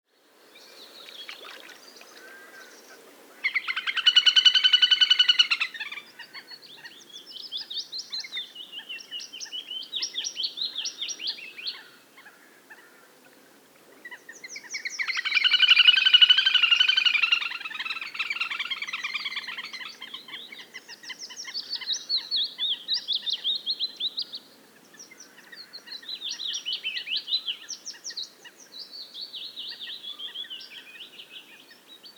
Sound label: Little Grebe